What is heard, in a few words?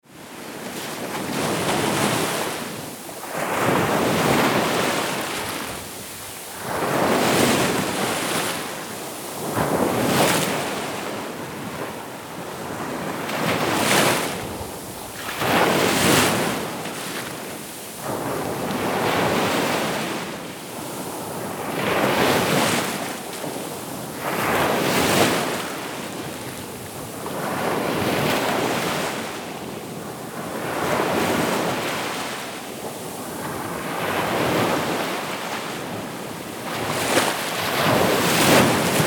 Ocean waves crashing sound